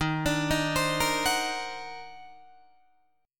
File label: Eb Minor Major 13th, Guitar, DADGAD